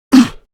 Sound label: Pain, Male; Warframe; Grineer Male; Battle Barks